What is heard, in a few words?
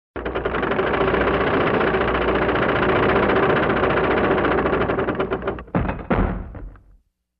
Heavy Bevel Gears rolling with bang